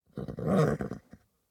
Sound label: horse grunt; teardown; ps5 windows xbox series xs